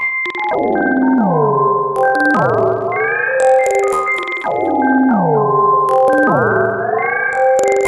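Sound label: drum loop; ゆめ2っき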